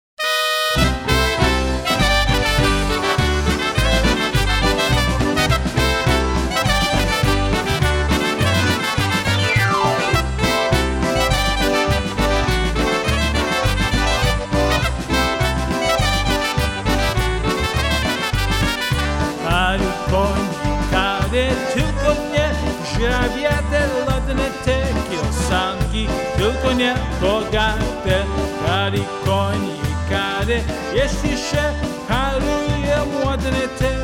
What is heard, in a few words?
Polka